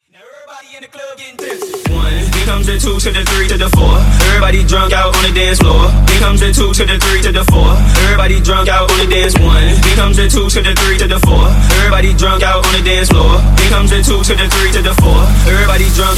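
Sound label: Bootleg 128 BPM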